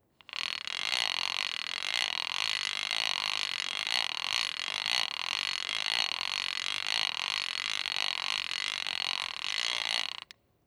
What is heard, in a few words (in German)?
Handbohrmaschine drehen